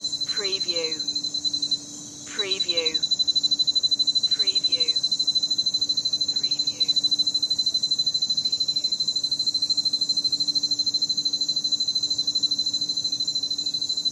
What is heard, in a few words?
night ambience with crickets